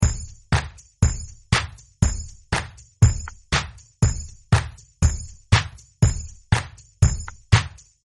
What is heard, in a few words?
Smack